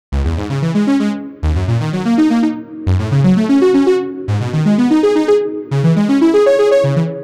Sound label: 9 steps up; the analog sound waves